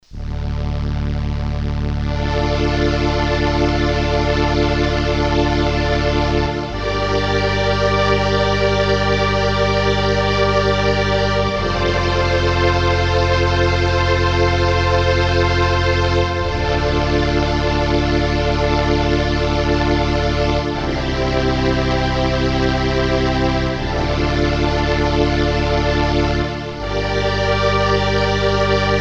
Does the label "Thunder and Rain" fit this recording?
No